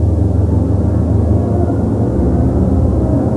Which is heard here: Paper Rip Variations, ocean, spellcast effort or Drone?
Drone